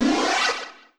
Magic

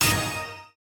Chaser Buzz

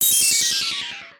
BONUS; Plok

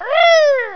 Kazooie Jump

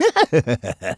Laugh; Killer7

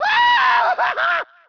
Pain; EWJ

